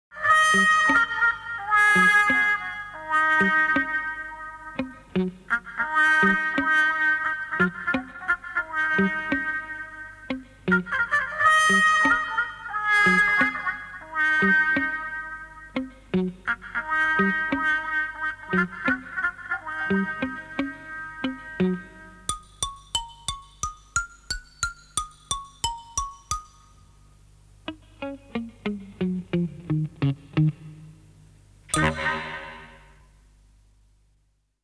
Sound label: mini wah wah